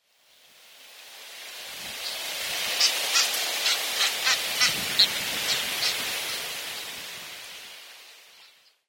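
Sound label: Brown Booby, ogasawara; katsuodori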